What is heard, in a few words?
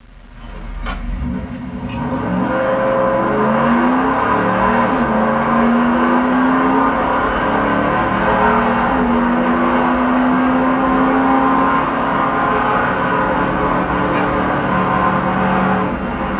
0-70mph with slider open; RM Indy